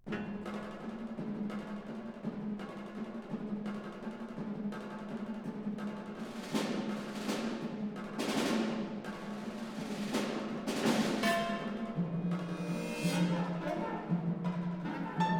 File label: for wind orchestra; A Child's Garden of Dreams; A Horde of Small Animals Frighten